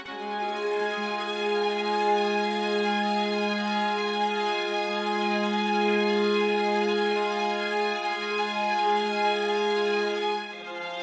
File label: string sectio loop, 87 bpm